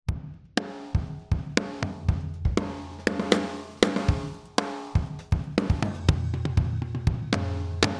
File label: BFD rev effect